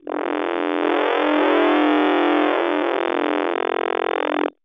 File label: Robot Roar